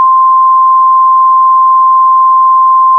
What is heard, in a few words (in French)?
note sinus do